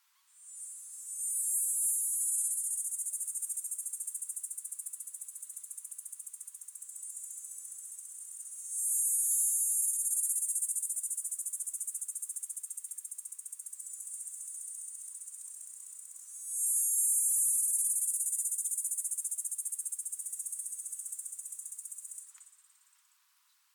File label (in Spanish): Chicharra